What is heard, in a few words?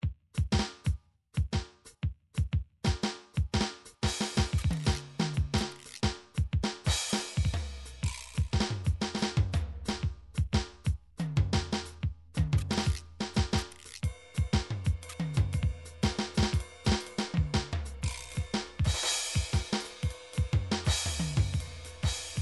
mod swing; Sonar